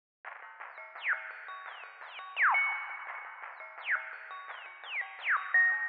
Nostalgic Melody Loop - 170 BPM D# Min Arp; Melodies; Loop Stems; Cymatics; Oracle Sample Pack

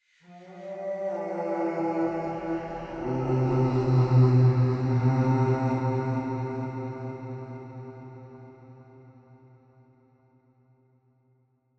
130bpm Am, Vocalpack

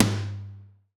Tom Acoustic; One Shots; Toms; Ghosthack; Drum Mega Bundle Demo; Drum Hero 5

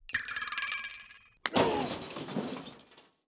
human burping

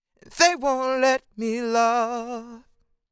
Sung Phrase Dry, 150 BPM G Min; Let Me Love; Cymatics; Euphoria Vocal Sample Pack

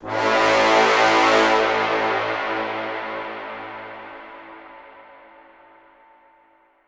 Brass Braam - Am 87Bpm; Construction Kits; STEMS; Ghosthack; SkyRealm; Kit 18 - Shivering Sea

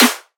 Snare

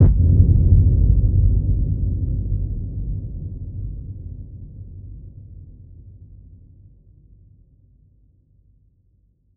Deep Hit

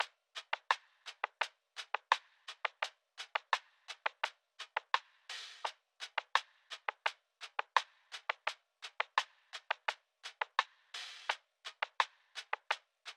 Drum Loop - 85 BPM Percussion; Loop Stems; Cymatics; DOPE DRUMS VOL. 1; Find Drum Loop

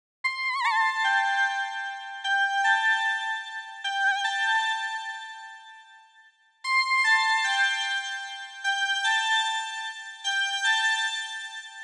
Happy Melody Loop - 150 BPM F Maj Lead; Melodies; Loop Stems; Cymatics; Oracle Sample Pack; Happy Melody Loop 3

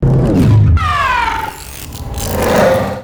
wooshes bent metal

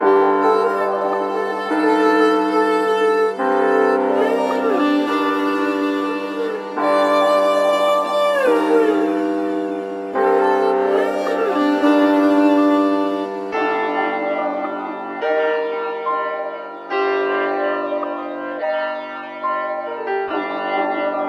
142 BPM G Min, Melody, Drill; Cymatics; 2022 Melody Collection

